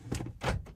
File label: unlock